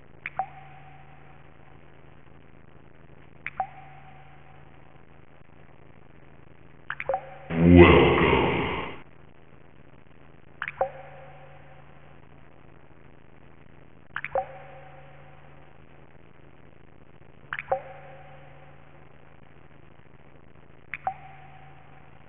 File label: Blood drip Welcome